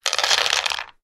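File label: game of checkers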